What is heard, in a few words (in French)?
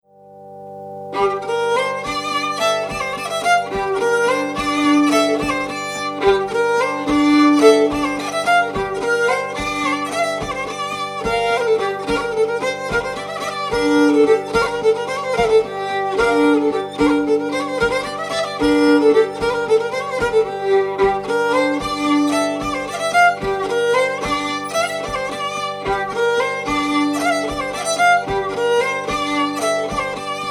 Bourree; Bourree d Ambrugeat